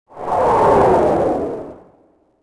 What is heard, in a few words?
drain, force, weapons